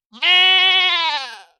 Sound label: goat, death; fauna